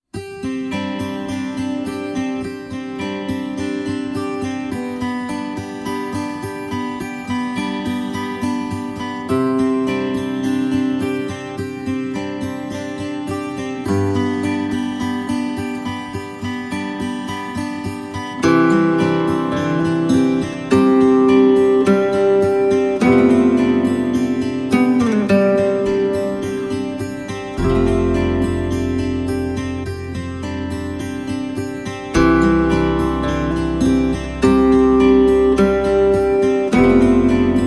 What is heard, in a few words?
guitars only